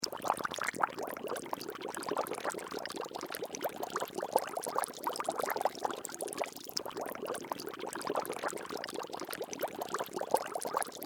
Horror Potion Bubble Water Loop; Scary Soundscape Sound Effects; Scary Soundscapes (Sound Effects:Horror)

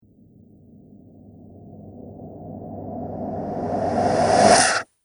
Zombie Monster Ghastly Breath; Zomby Sound Effects

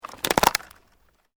Branches Dead Break; Material components for constructing more complex sounds; Breaking (Sound Effects:Objects)

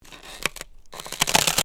Ice: creak of ice under pressure; Snow; Weather; Snow Sound Effects; Stuart Duffield

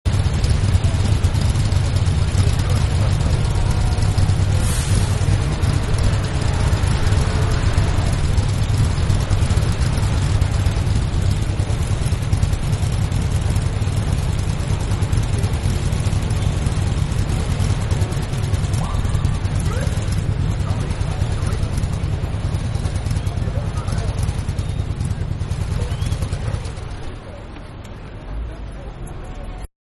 Motorcycles Police; Motorcycles (Sound Effects:Vehicles)

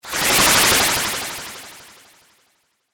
Whoosh Magical, Projectile, Accent, Transition; Production Element, Imaging Element; BlastWave

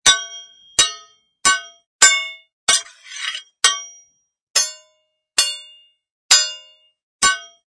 Sword Fight, various sword clank and slide sounds; Long sound file; Swords; Combat

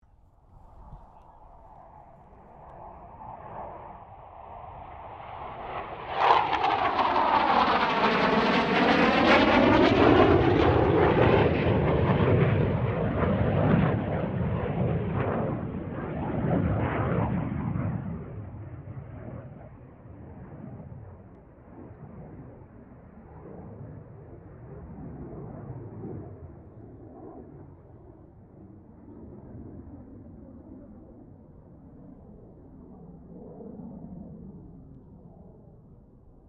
AIRCRAFT CA-27 SABRE PASS STEREO

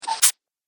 Cartoon Pumping Sound; Cartoon Sound Design Sound Effects

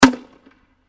Grenade Launcher 40mm M79 Single Shot; Close Perspective; Warfare; Blastwave

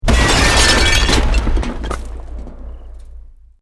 EXPLOSION MEDIUM BRICK WOOD GLASS NO FIRE

